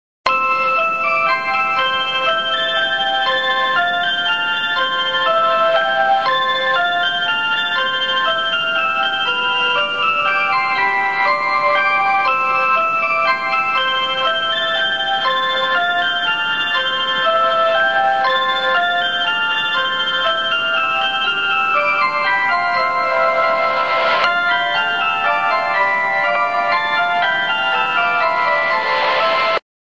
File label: bus chime